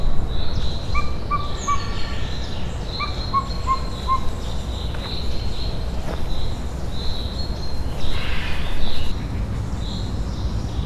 hangot ad, szirti sas; szegedi zoo 2011

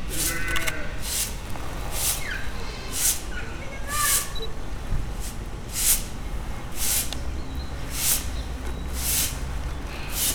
szuszog, sokszínű háttérzaj, nílusi víziló; debreceni zoo 2012

